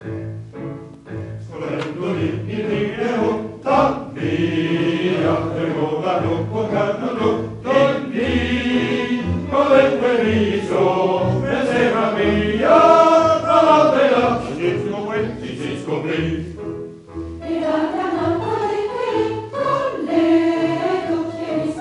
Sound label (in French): choeur; choeur de belle; esquelbecq; scorrendo